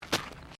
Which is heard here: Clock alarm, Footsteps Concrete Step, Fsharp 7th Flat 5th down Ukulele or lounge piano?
Footsteps Concrete Step